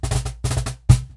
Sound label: Cajon Fill; musicradar